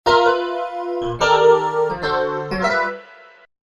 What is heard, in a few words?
Hybrid P, Roland V-Synth; Factory Patches